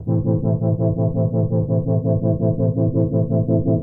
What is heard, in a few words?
DEEPHOUSE ORGAN 125 A